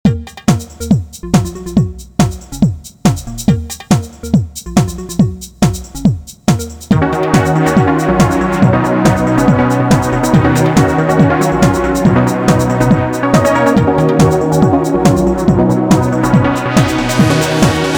novation ks5; factory demo

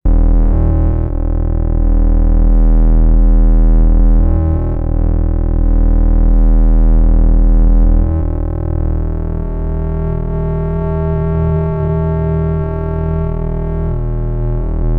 doepfer ms-404 sounds, bass fx

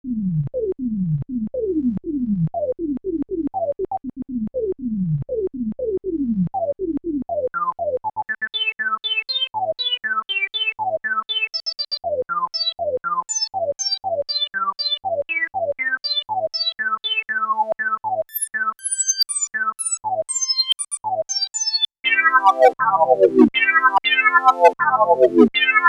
kawai k11 filter lpf + lpf ser q100

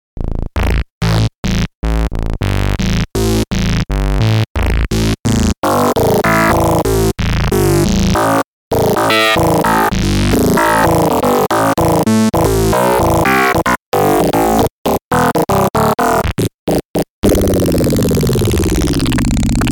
hohner hs-2 e sounds, 4op phase distortion

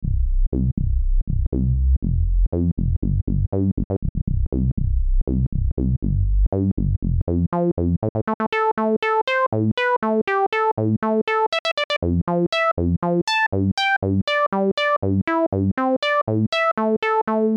synth1 vsti filter demo lpf12; ichiro toda

